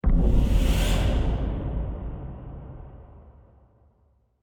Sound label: UI Quest list; preta vendetta rising ps4 windows gamerip 2017